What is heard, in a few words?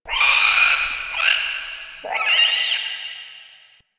interior screechy call; Ambience; Misc Indoor; DE; Warframe; Infested Eris